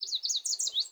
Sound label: Sparrow Song; Animals; Vocalizations